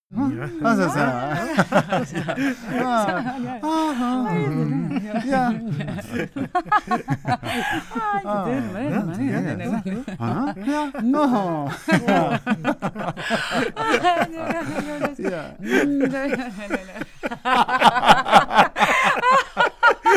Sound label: crowd happy walla